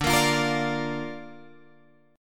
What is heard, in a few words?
D Minor Sharp 5th D Guitar Open G